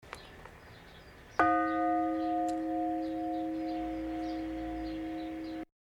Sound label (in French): cloche; St Aventin, cloche 2